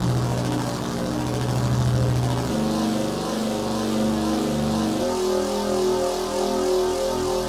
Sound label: Warp Field; Warp Field Projekt